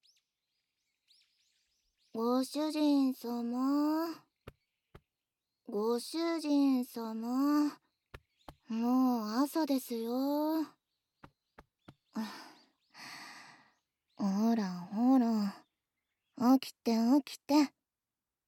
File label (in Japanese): 耳舐めローション手コキ